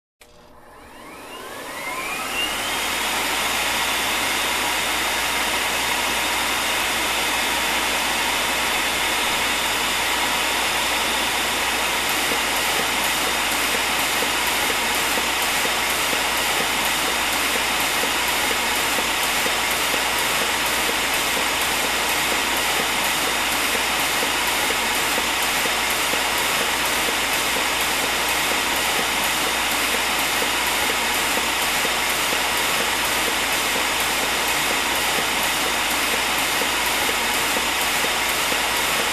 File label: Electric Industrial Heater turned on and off